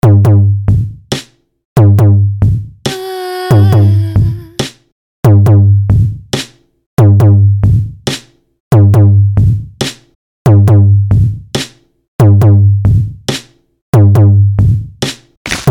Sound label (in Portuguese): base pra rap conciente